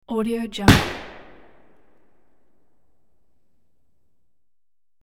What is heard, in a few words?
flint lock rifle single shot